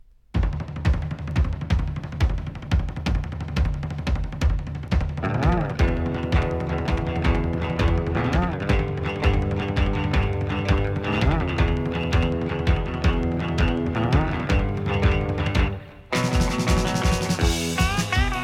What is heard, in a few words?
Driving Guitars